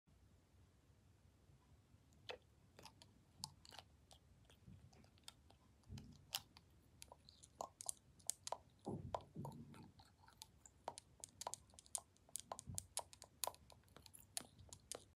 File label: dropper; قطره چکان چشم